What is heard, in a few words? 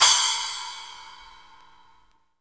SPLASH, Drum Kits